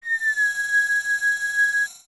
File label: Dispel; Farores Wind; Zelda; OOT